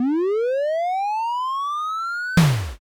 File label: defib